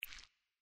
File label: UI menu rollover